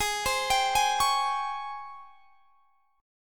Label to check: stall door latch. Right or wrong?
Wrong